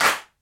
hand clap sound G# key, Royality free clap single hit tuned to the G# note. Loudest frequency: 2649Hz; single hits one shots